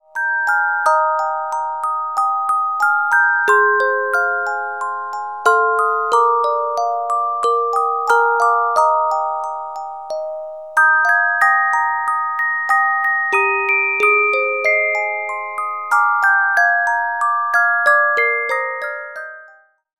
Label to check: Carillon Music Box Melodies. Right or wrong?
Right